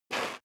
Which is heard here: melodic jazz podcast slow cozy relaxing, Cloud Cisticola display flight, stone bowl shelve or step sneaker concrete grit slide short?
step sneaker concrete grit slide short